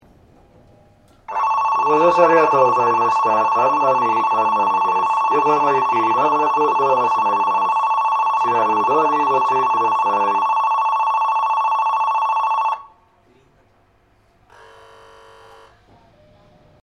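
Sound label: Kannami departure; Yokohama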